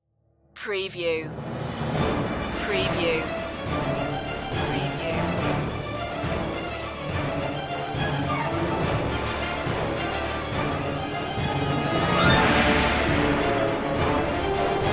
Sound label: no choir no shouts